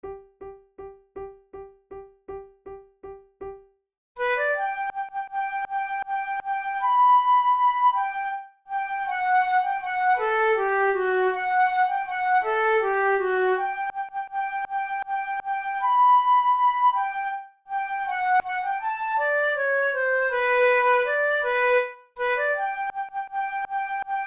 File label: waltz duet; hummels